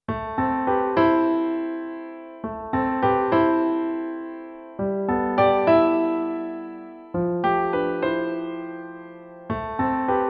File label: Guitar Rig